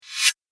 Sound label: Clean Grit Swipe, whoosh